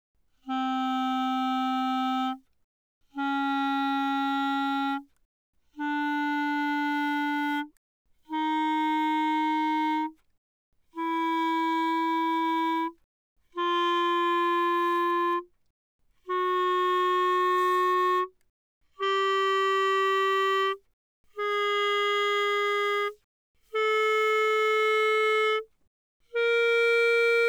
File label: Eb Clar ff C4 B4, Eb clarinet, woodwinds